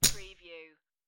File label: Poker Chips Three Chips Dropping On Felt